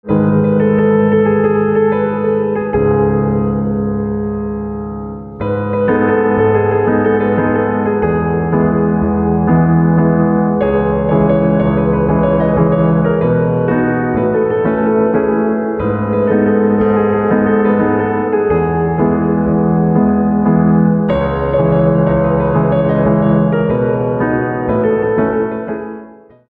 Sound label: Piano, instrumental ringtone